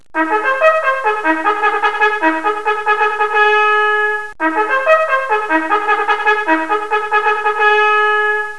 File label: boots and saddles